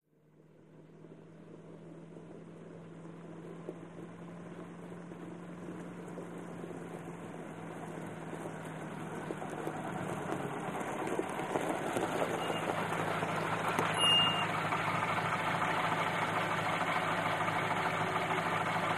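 Buick, In Slow On Gravel, Stop close up, Rough Idle, Off, antiques; 1938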